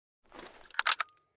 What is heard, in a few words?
mosin reload, weapons